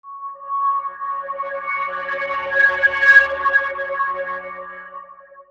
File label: Whiney Atmos; Zero-G Sound Sense Grime Scene; 90 s Sample CDs